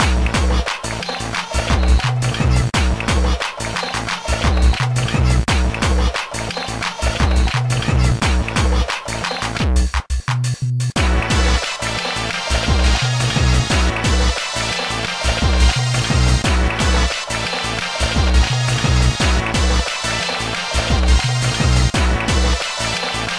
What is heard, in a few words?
bgm music